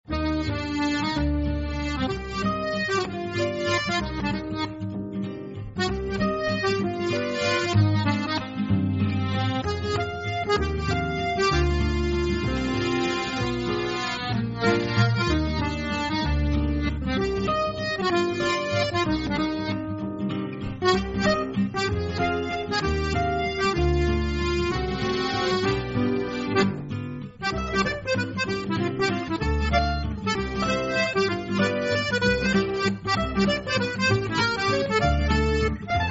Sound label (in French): Le Rural Swing